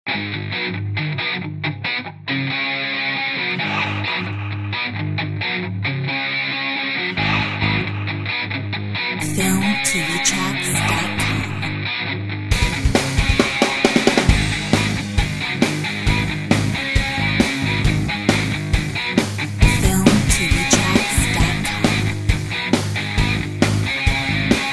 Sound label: Uplifting Inspiring Royalty free music